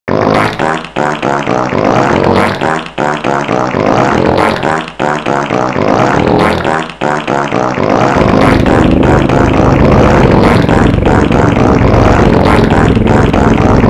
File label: every instrument is a fart sound effect